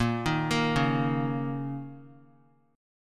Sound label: B flat Minor Double Flat 5th Guitar, chord; Bbmbb5; arpeggiated; x,1,1,3,1,x; JGuitar